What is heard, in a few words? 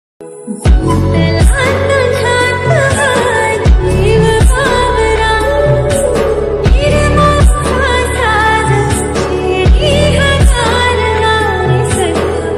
marathi ringtone, romantic ringtone